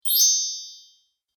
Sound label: Magic Spell Gliss; Magic Sound Effects